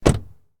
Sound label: Chrysler 300M Door Close, Automobiles